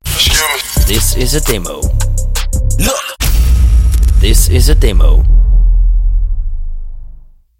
Hip Hop RnB 1 bar; 1 bar of coolness

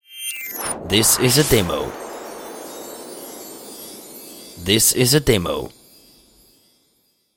Soft riser woosh bells; Xmas

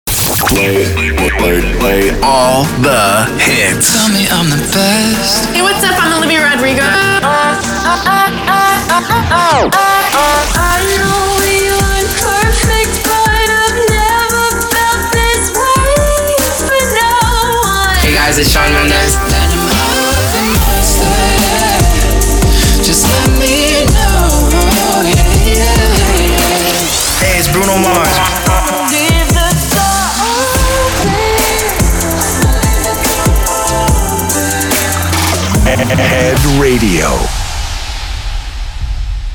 PROMO MUSIC 144 bpm

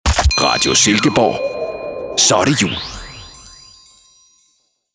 Xmas ID

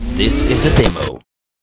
Rise and stop

